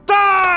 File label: car horn